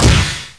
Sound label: default stop, door; doorshl2beta; func door